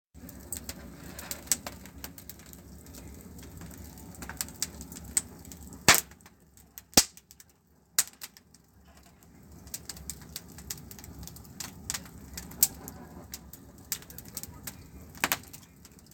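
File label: crackling of a campfire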